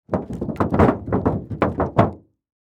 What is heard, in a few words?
Impact Automobile Hood, Buckle; Crashes; BlastWave